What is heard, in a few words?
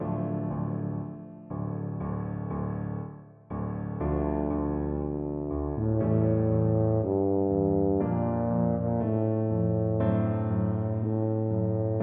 faure libera me, tuba